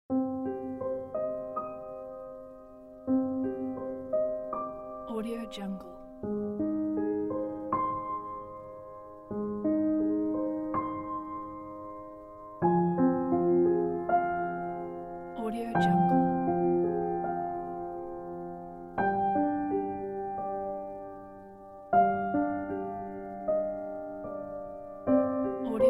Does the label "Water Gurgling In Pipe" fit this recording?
No